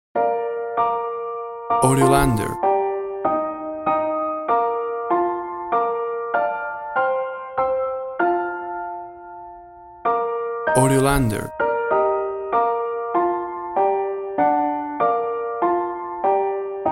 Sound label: While Sheperds Watched Their Flocks Piano Version